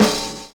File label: OLD SNARE; Univers Sons